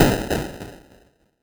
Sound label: mage death; Incantation